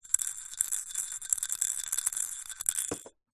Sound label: household broken ceramic cup piece x1 movement shake in cup; zapsplat